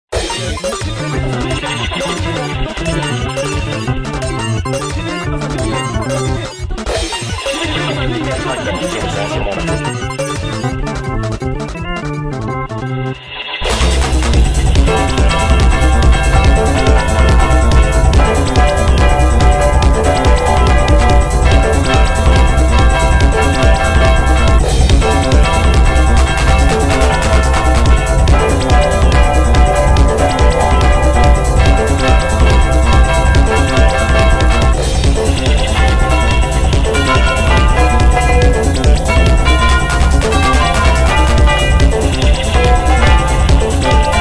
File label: tubular bells